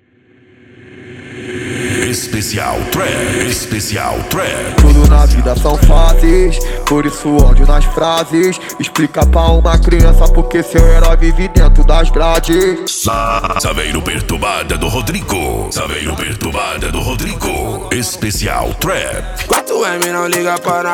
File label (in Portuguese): trap